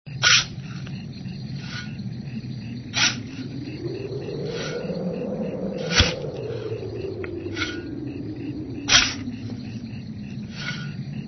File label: digging